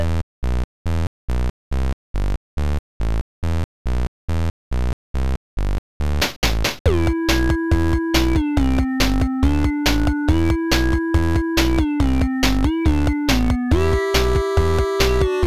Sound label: song